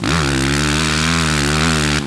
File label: Power, Engine